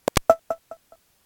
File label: dsi browser home sound